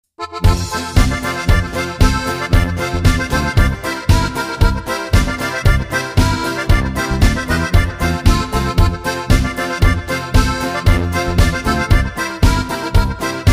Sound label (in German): Boarischer